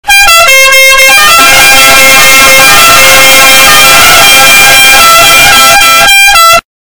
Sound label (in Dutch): toeter